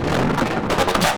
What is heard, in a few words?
Machine Quick Transition